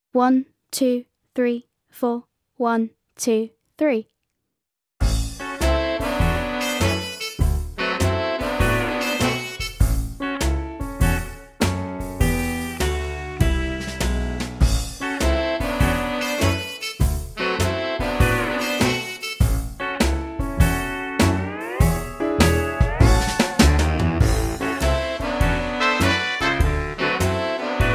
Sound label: backing track